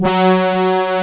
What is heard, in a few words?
HO G3, ANA HRN